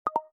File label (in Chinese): 轻弹; Teams; Classworks